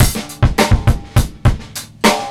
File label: beat, Roots Funk; USB Soundscan vol.41; AKAI